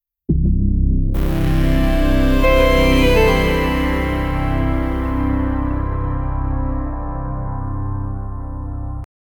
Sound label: calm easy intro sound, relaxation